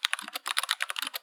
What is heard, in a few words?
keyboard typing